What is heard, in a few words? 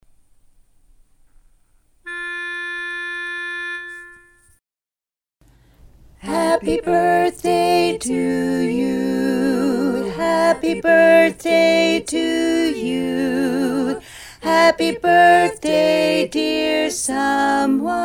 Happy Birthday Bari, chorus